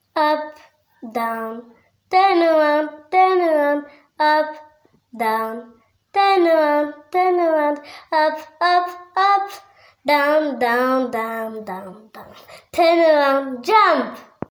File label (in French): CHANSON